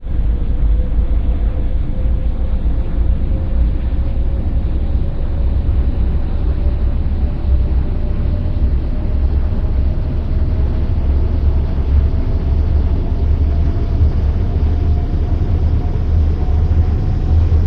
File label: clamp; ships misc